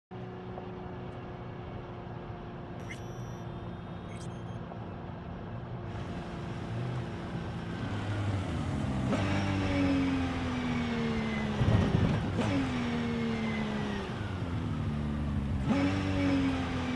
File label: driving with a car; Cyberpunk 2077